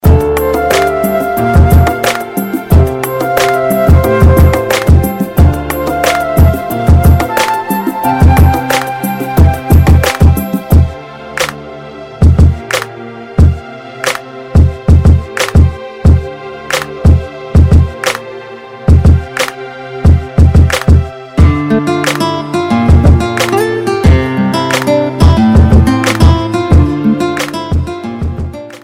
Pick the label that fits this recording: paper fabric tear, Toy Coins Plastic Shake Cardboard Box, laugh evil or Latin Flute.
Latin Flute